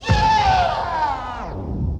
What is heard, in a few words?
Kaos FX, Ambient Grooves